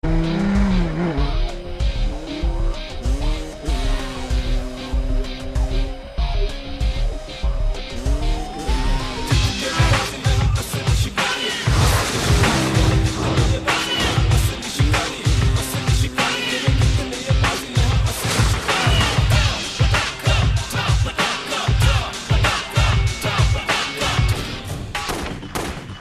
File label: Punjabi Rap